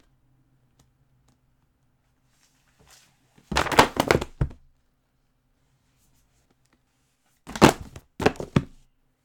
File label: Books falling